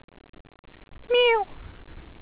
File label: ripples; rover league